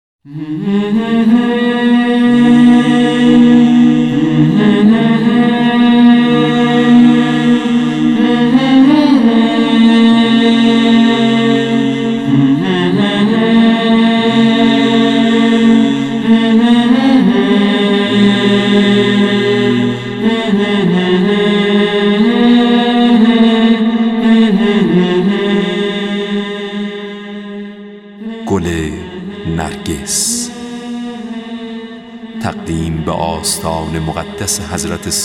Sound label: Vocal Ehsasi